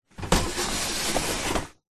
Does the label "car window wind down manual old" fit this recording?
Yes